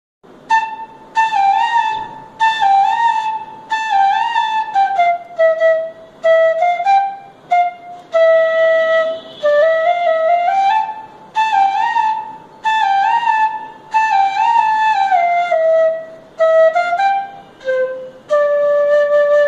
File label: Flute Ringtone